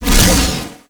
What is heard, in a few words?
Corpus Arachnoid Camper Shield Launch; Warframe